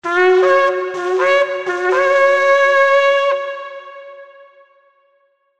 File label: Shevarim